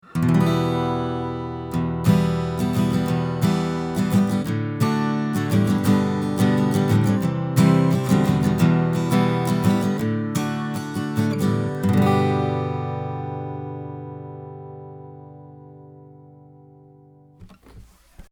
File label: Neumann V402 Acoustic Guitar 20cm Schoeps MK4